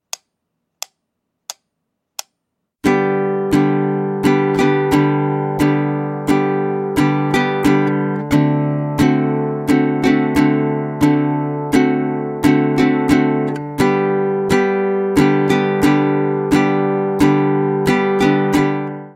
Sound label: baritone uke